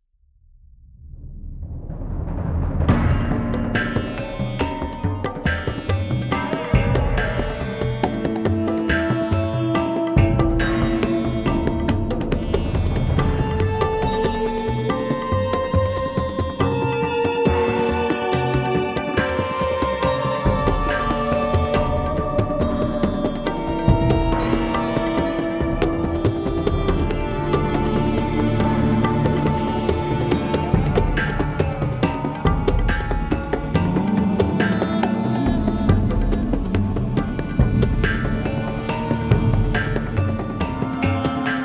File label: Loop Fight